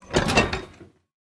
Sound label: copper chest open